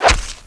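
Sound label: knife hit body; wanted; weapons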